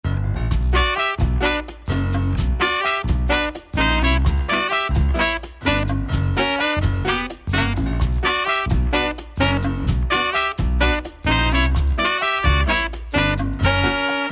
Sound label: Havanna Tango